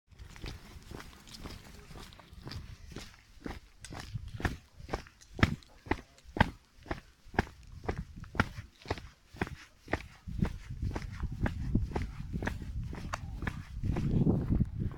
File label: Asphalt Walking Heavy Shoe